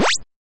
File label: Counting pinball bonus blip